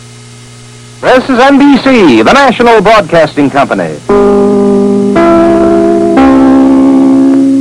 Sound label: chimes; nbc